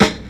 old school short snare one shot A key; snare-drum-samples

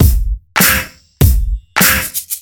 99 bpm hq drum loop c sharp key

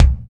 rich mids kick drum sound c key

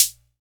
present hat sample f key

